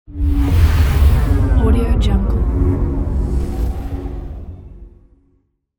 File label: landing spaceship; infinity-sfx-productions